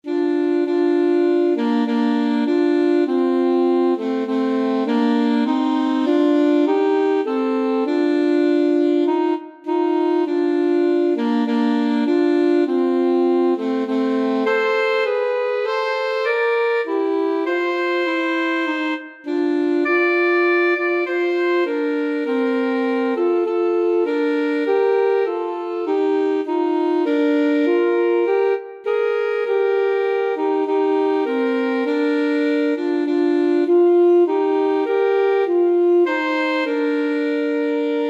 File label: america the beautiful alto saxophone duet